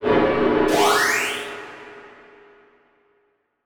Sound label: Transition Hit Impact Long; Production Element